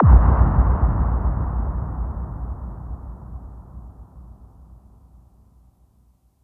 Female FX Hall Kick, 140 BPM; Vengeance Samples; Vocal-Hook Kits; Song Kit

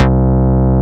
Basses Root C; Vengeance Samples

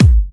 Hard Kicks; Vengeance Samples

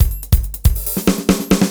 Fill 140 BPM

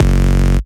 Bass Cuts; Vengeance Samples; VES1 Instruments

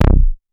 Synth Bass; Vengeance Samples; VEE Synth Shots

